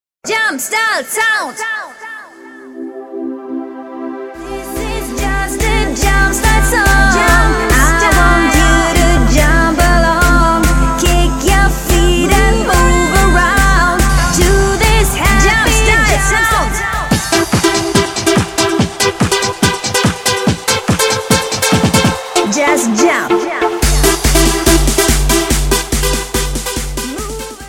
Jumpstyle Song

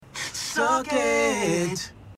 Sung Again

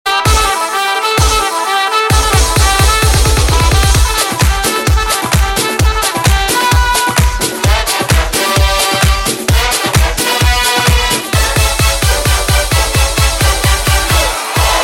Nice Misic Playing

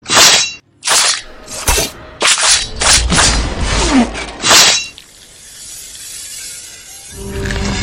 wolverine snikt

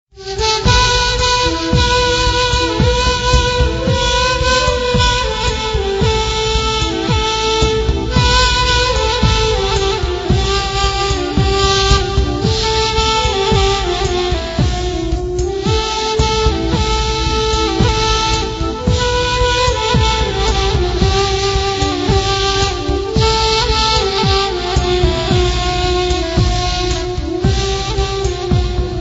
Persian Pipe

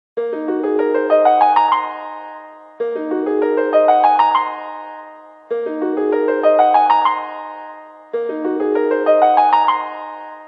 Nokia 8800 Piano

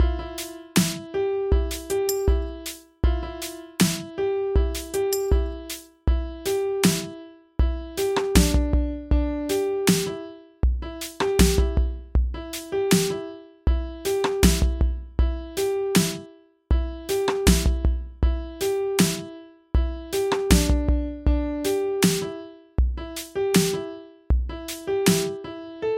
Emotional Ringtone

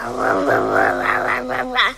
Werewolf Girl

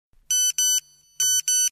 Digital Clock

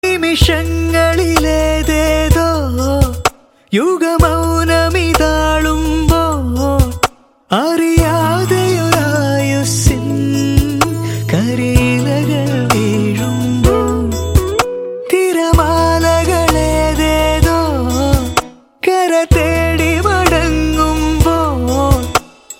Malayalam Love

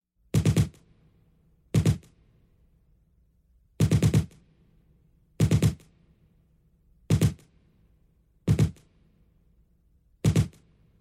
Machine Gun

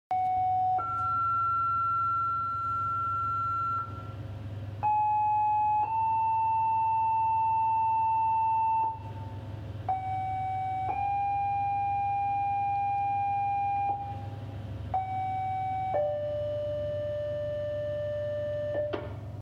EMS Pager tones

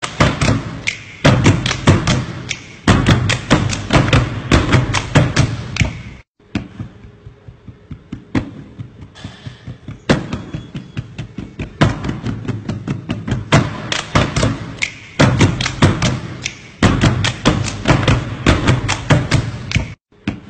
tearing

